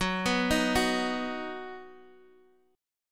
F sharp Suspended 6th; F#sus6 arpeggiated; chord shape

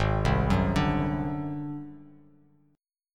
Ab 7th Flat 5th Ab down Bass Standard; Ab7b5 arpeggiated; JGuitar

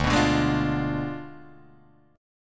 D Diminished 7th D down Guitar Drop D

